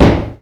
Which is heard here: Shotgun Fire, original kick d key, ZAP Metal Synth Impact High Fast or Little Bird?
original kick d key